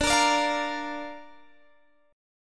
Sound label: D Minor Sharp 5th D, guitar; strummed; x,17,20,19,18,18; Dm#5